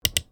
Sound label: mouse good